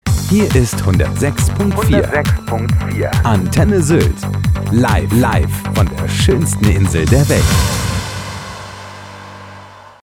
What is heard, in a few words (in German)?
Jingle; Antenne Sylt